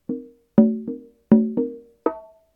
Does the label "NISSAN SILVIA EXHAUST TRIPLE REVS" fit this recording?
No